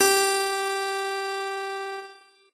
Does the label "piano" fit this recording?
Yes